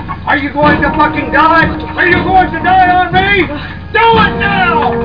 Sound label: die